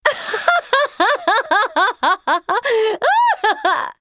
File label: laugh; Miss Fortune